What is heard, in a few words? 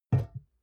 glass door small close soft